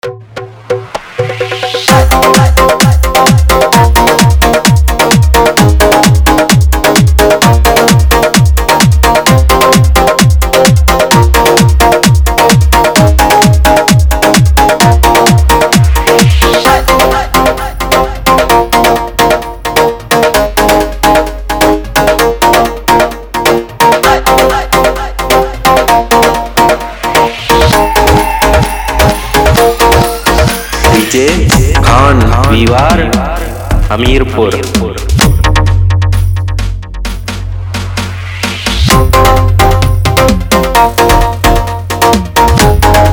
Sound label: New Version Sound Chek - Remix